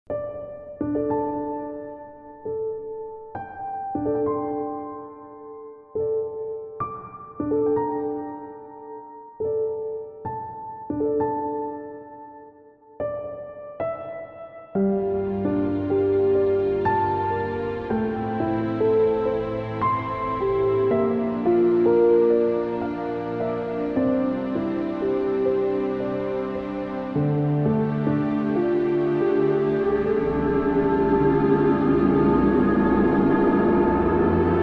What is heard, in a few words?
Piano Strings